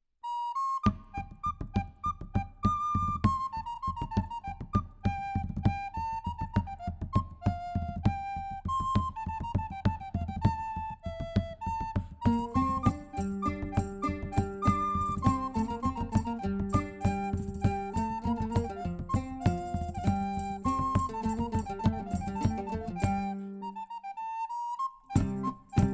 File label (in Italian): danza